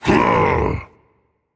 tongue rage; chaac

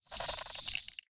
scorpion insect click; smite; npc

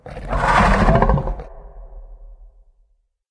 idle breath; smite; Anhur

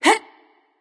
grunt jump; smite; voicepack; isis

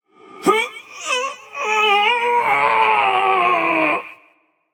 grunt lift; chaac

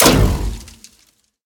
fire; smite; medusa

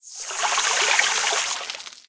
god vamana defeat base tears long; smite

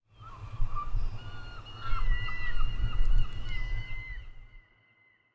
ambience good bird; env s8conquest; smite

